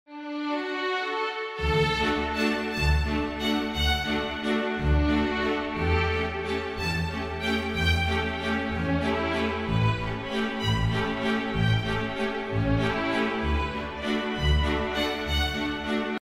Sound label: waltz